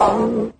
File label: "ooh" 8 bit arcade woman